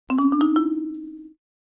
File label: Marimba Accent; mallets marimba